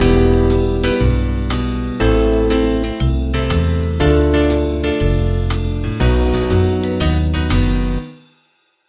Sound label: Jazz Melody